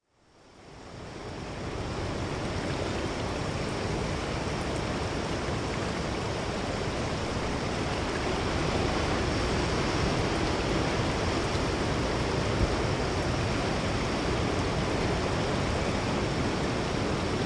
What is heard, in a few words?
Green Noise